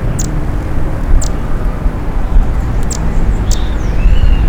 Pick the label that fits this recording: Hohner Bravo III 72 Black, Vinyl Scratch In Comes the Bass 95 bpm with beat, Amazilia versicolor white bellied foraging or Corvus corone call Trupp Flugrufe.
Amazilia versicolor white bellied foraging